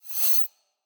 win presentation animate win amount; arcane

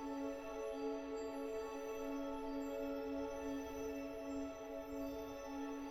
main Game Chord A; fairymirror

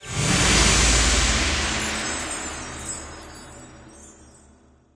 mega Win Text Change; temple of nudges

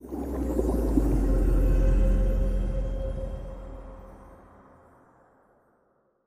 outro

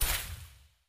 win Symbol Flash; fairyhansel

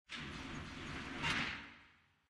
page turn; cashomatic

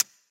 chip; frenchroulette3

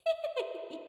fairy Giggle; fairymirror

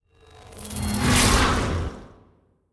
evil deal damage; arcane

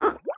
duck hover; scruffy duck

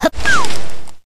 symbol pass; jingle spin

